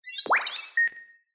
science fiction computer console gui robot complex beep